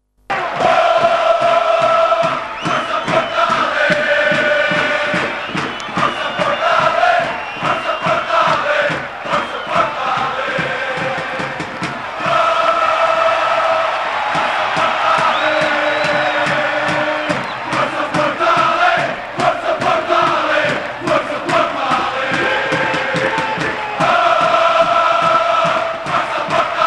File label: Forca Porto Allez, Chants